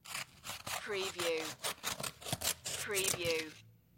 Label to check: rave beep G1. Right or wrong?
Wrong